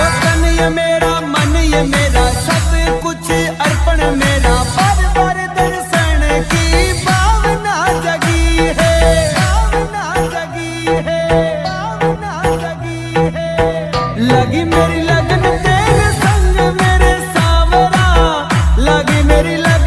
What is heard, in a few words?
Ringtone; Sawariya Seth